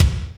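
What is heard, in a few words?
Reverb kick, Boss DR660; drum machine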